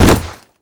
foley generic slam wall

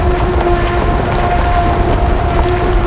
mohole pressed down loop

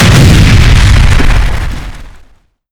explosion building big hit; Explosions; Call of Duty Modern Warfare

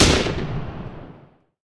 ho103 last shot left, guns; War Thunder

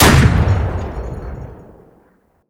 Automatic M242 Single, Weapons; DCS

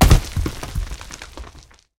rock fall; tanks; War Thunder

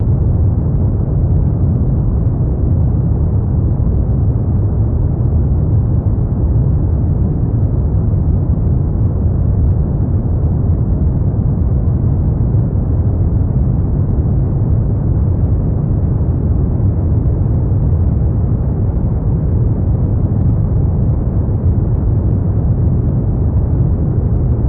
F15 airflow, aircraft; DCS

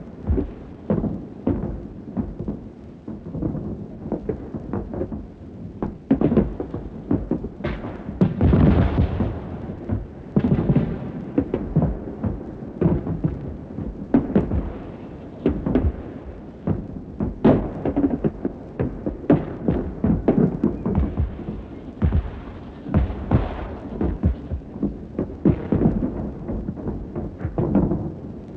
Amb Destruction; Red Orchestra Ostfront 41-45

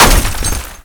physics suburban crash impact

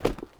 drop rubber; Rust

